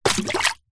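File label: leisure frisbee plastic land water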